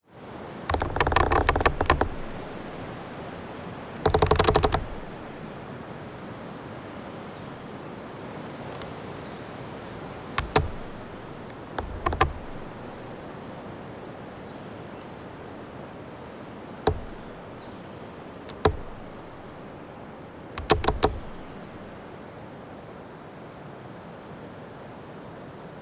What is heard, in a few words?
nature woodland tree creak patagonian forest argentina close up; felix blume